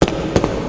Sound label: footsteps muffled